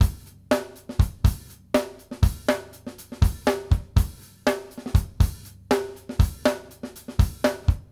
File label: BASIC B R, Drumworks